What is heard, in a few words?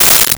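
Ratchet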